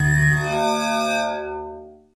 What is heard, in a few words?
Scanning Beam, scanners